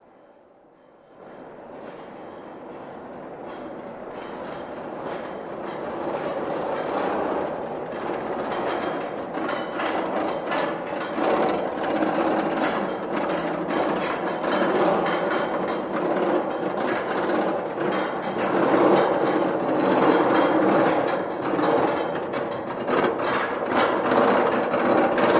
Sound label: Roller Coaster, On Board Perspective, Complete Ride.